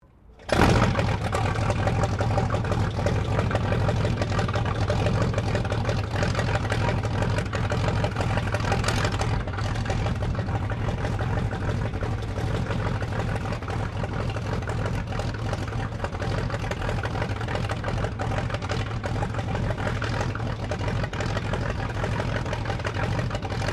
Aircraft 1916 Sopwith Pup replica startup idle, WW1; Replica of a WW1 Sopwith Pup; Airplanes